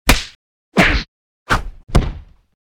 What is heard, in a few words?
Hit; NoizBoy; Hong Kong Action Kit - Hit Kit #2